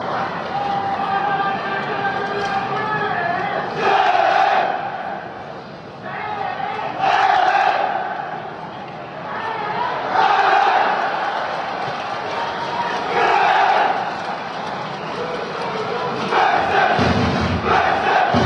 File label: g e n k, chants